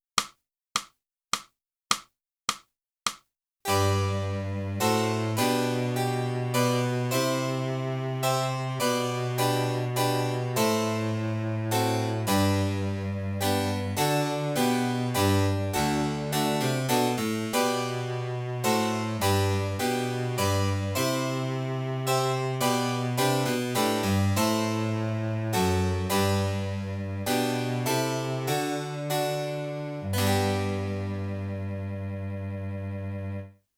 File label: clarinet, minuet; (Morgan) - Boosey Woodwind Method: Clarinet (Book 2)